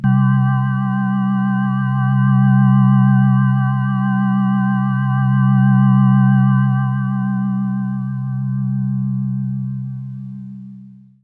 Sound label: PAD Glass Roadz pad